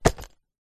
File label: game leather bag drop mechanical gear mechanism accent; ancient game; epic stock media